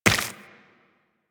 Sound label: Run Flesh, Footsteps; Warframe; Tenno